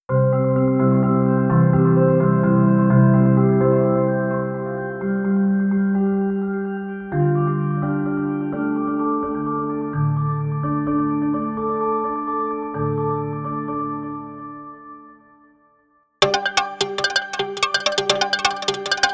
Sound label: Arpeggiated